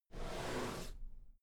drawer cabinet equipment open soft halfway

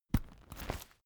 umbrella telescopic close

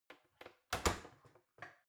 oven close fumble

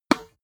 tennis ball hit

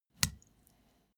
step barefoot wet

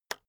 keyboard external enter hit release

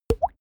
glug

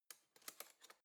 cutlery spoon drawer grab soft

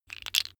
tiles wood

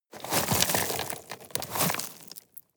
sweep small rocks

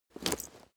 leather metal movement soft single

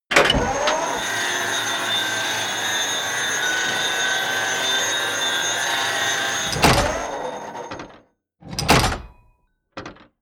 tank large turret rotate

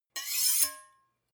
two knives large scrape short

